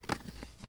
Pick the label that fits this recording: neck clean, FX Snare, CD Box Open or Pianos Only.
CD Box Open